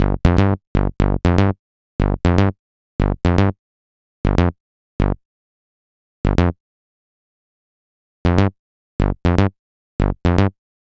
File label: Bass, EDM; Sonic Foundry (Sony Creative Software); Afterhours EDM